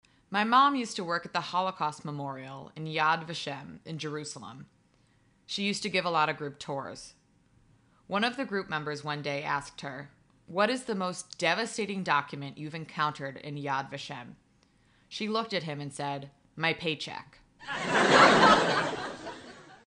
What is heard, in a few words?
Laugh Track; Noam Shuster Eliassi; Joke